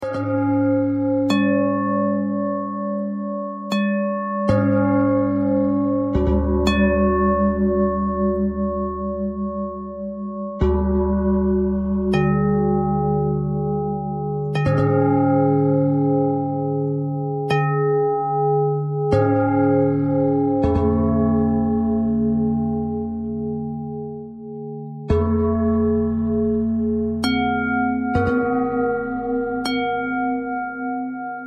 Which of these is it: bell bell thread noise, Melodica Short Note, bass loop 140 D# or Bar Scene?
bell bell thread noise